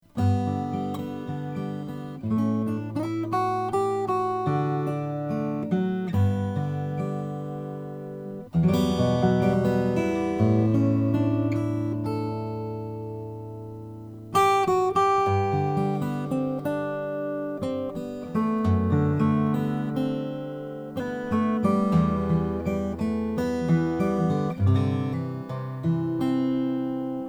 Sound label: goodall mhgc mahogany